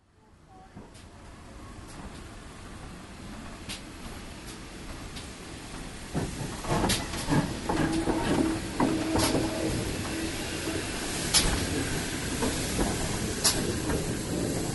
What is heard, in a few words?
train approach and toot